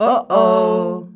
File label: "oh ouh", MALES; Best Service; Hyper Dance